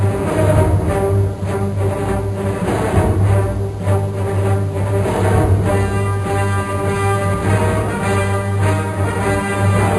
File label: tune; chaos